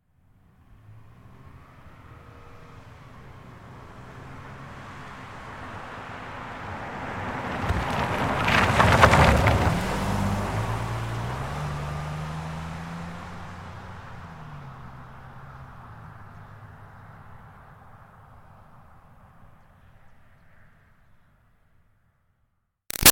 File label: Car Highlander By Corner Dirt Med; 2005 Toyota Highlander V6; Cars; Vehicles; Big Room Sound